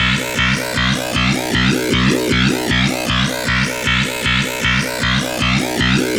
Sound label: 155bpm snare synth B; random and out of place